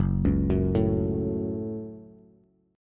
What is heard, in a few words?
Gb Minor Gb Bass; arpeggiated; JGuitar